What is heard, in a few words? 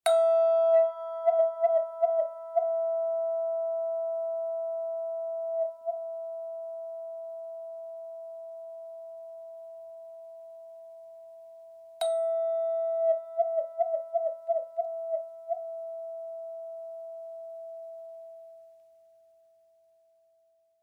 VIBRATONE